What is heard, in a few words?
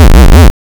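waveform FM square sine silence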